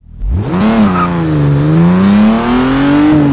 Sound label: first, vehicles; gmod; tdmcars; for taurus 13